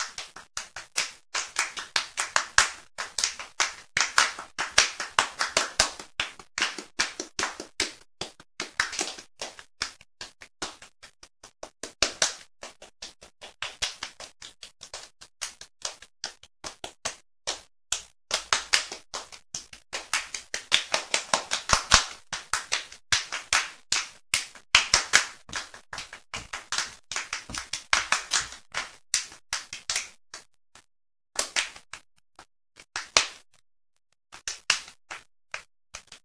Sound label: clap